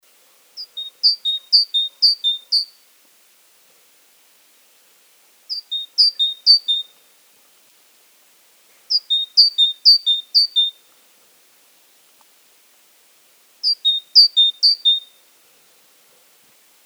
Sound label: Parus major; SETE